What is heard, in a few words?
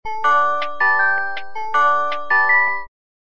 Bad Horse